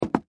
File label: Footsteps Wood Step Single; Human